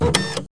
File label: Machine